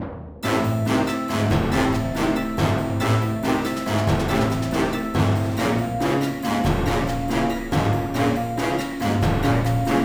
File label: Tension